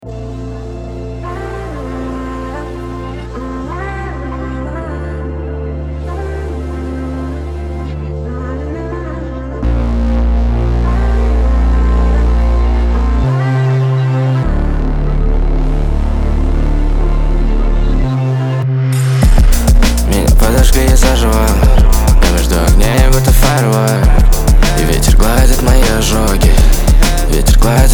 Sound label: fireworks